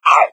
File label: pain, hazmat zombie; doom3